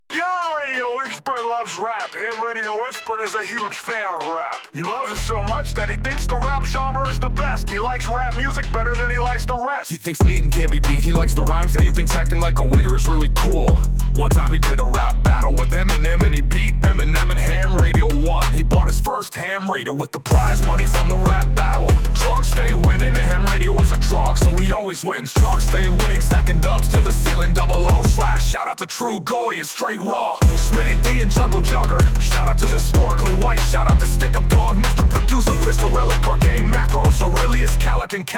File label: rap